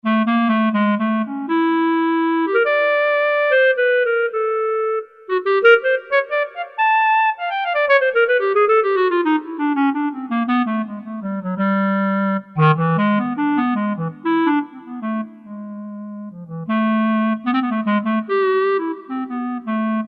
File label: Clarinet, Korg T3